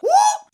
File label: whoop male, voice; ooh ahh; ho hoo woo